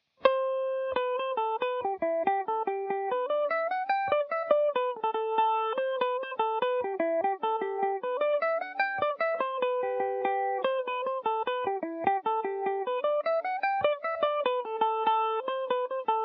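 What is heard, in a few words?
G major, Irish mandolin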